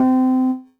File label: piano c; school; Tony Hawk's Project 8; XBOX; inschool